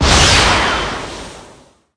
Light Shoot; borsalino; battle

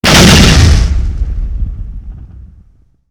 fire

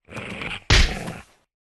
attack; hero sound

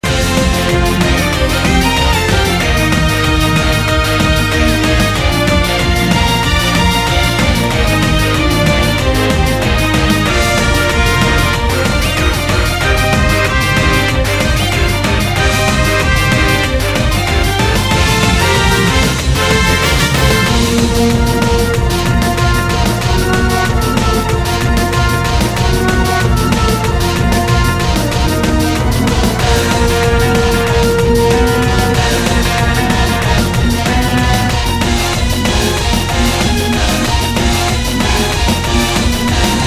battle

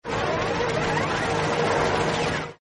skill magic

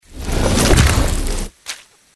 attack; hero sound

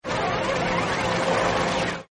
equipment forging

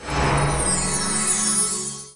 skill magic

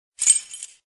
money

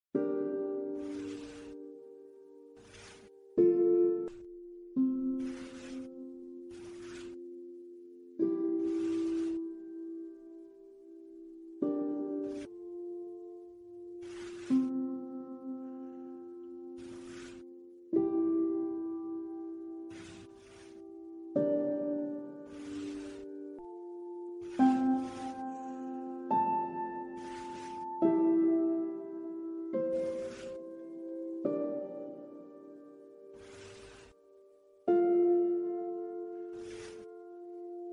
bgm

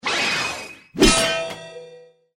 attack; hero

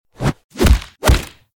attack; hero sound

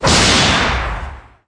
Crash; kid; battle

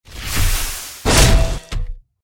attack; hero

